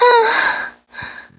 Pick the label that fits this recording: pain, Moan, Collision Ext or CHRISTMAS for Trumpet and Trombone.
Moan